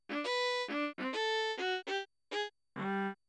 Violin

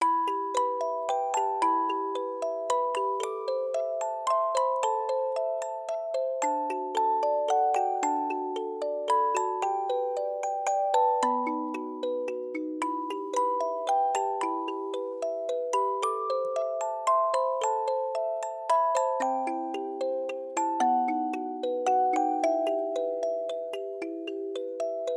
Music Box

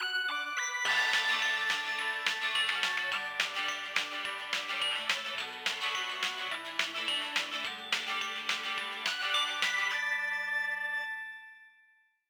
Radio Jingle (Morning)

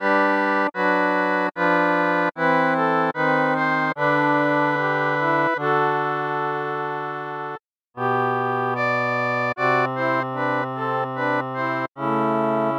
Chorale (Aircheck, Hi-Fi)

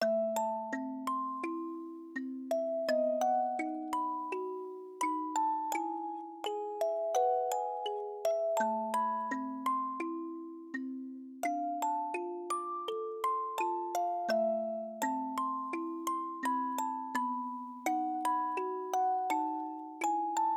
Music Box

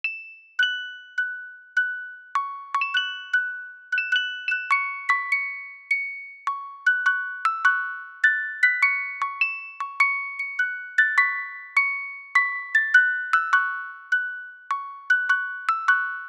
Music Box

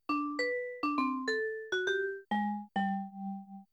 Vibraphone

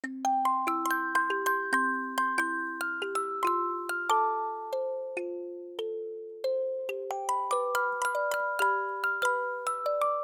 Calypso, Music Box

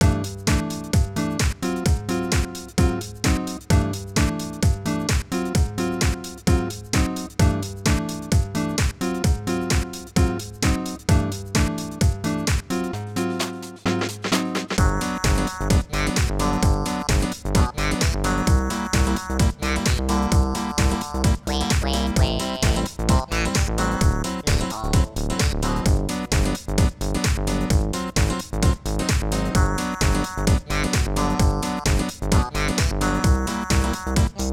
Bossa House Remix

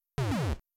Cannon

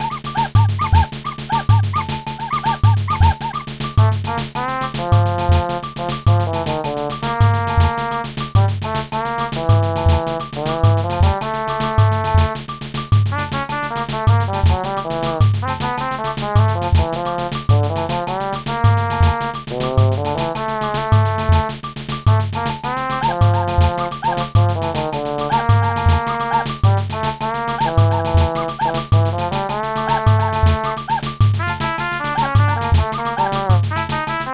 Samba (Aircheck, Hi-Fi)

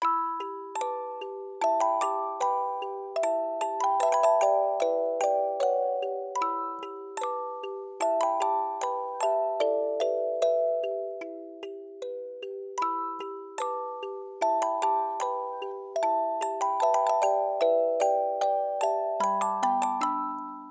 Music Box

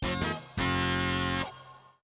Zap Outfit

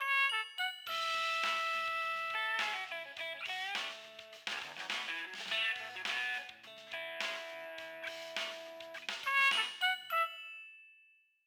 Radio Jingle (Morning)

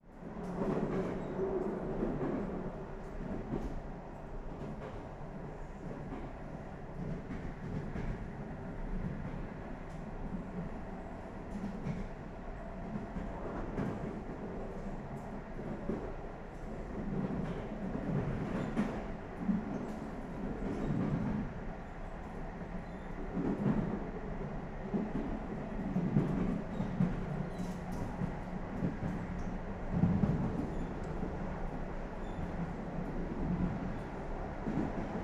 Train Soundscape

